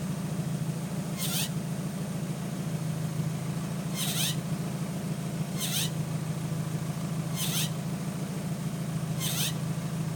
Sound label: pump